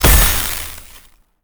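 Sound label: icepack spawn, monster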